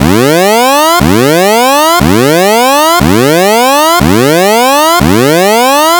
Heavy Alarm

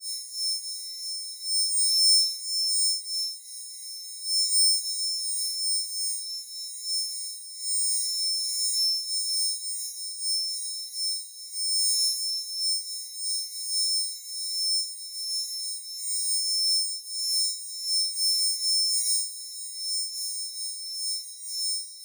Sustained Metallic Tonal Resonant Ringing

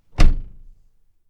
Shutting Car Door

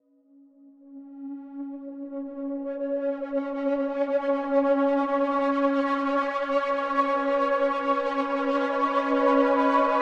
Synth swell

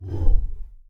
Whooshy Puff

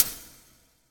Sizzle hat verb

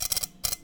Kitchen

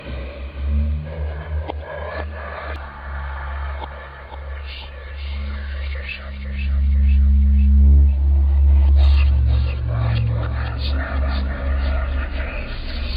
Scary Demon Haunting Sound

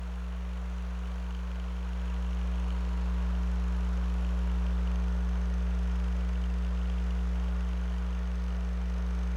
Loud fan

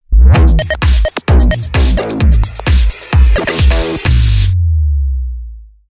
Bleep

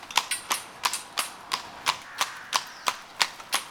Horse Carriage Loop

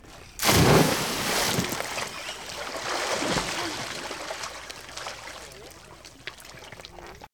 Pool Summer Sounds Large Splash

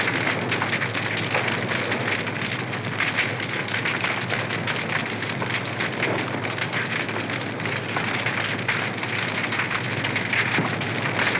Heavy Fire Crackle

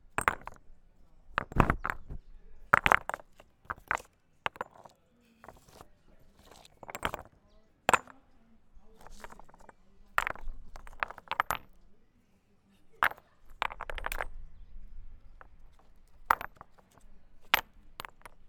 Rocks Being Thrown

